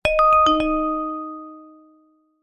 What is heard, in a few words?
multimedia alert chime short musical notification; zapsplat